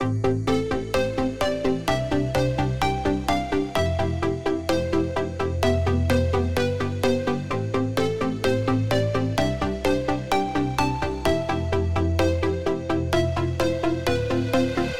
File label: 128 BPM A Min Ambience; Loop Stems; Cymatics; Paradise; Breakdown Loops